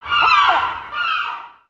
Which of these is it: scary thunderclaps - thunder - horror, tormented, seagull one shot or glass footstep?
seagull one shot